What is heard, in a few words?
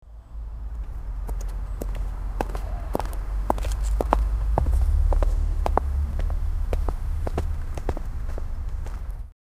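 extremely slowly walking in, snow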